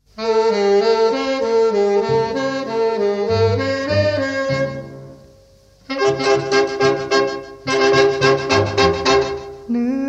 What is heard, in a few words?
Cha Cha Cha